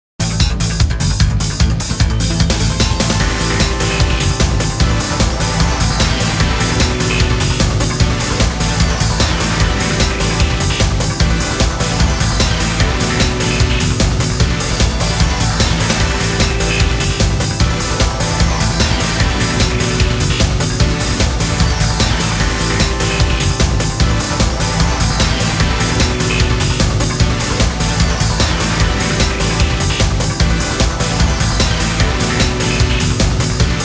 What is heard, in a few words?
Digi Rock, BGM